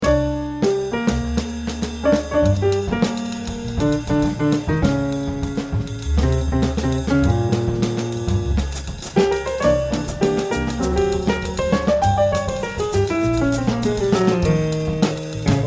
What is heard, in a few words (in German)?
Samba Idee